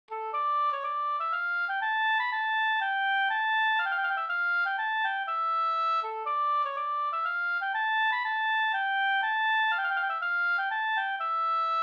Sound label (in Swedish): polska godnattlat